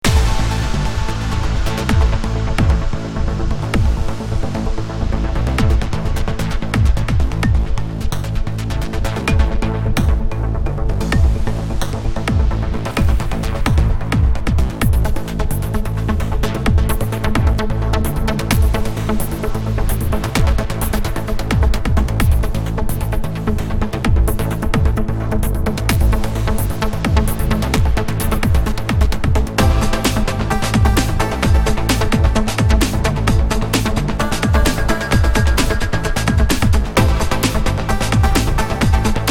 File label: electronic battle